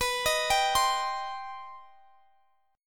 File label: C Minor, B, chord; arpeggiated; Cm/B